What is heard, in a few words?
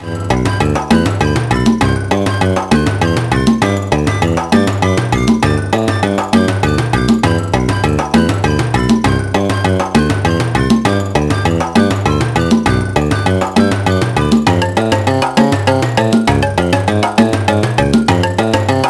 Bonus Level